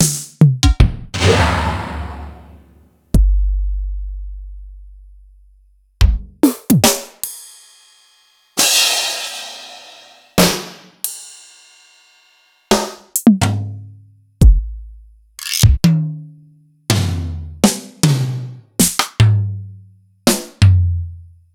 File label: Yamaha RY20, Samples Sliced; m8